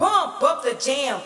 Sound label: samples